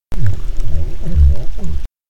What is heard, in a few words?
Minke Whale Boom